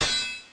Metal Claw; pokemon reborn e19 2022

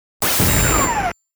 Coin; Raiden

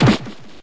Stomp; pokemon reborn e19 2022

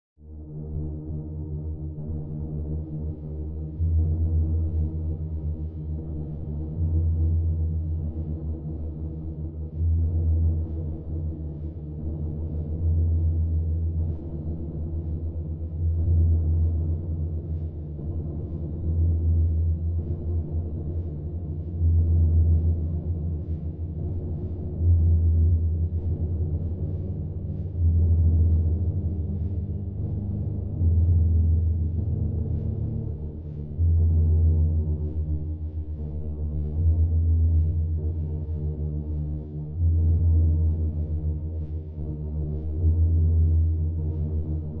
Suspence Pad, Low Intensity; apex legends season 12

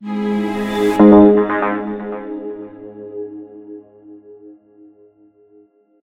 Transition

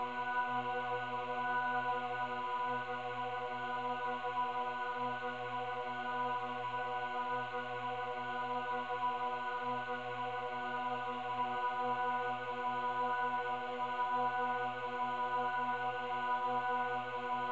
Boss Totem; Plants vs. Zombies Battle for Neighborville; DreadWood Quest2 Squash

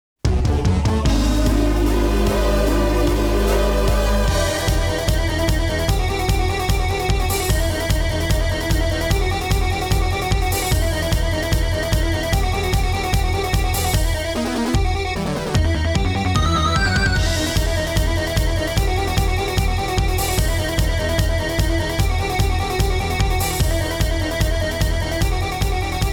Surprise; Perfect Collection Dragon Slayer The Legend of Heroes II

